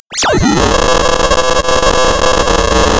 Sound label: pop, tearing paper